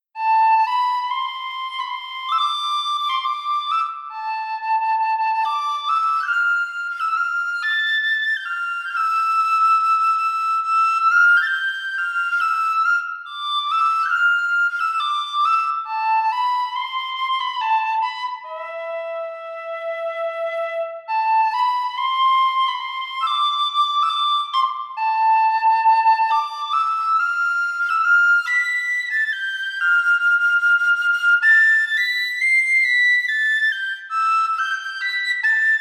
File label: whistle longing for home; Shire Whistle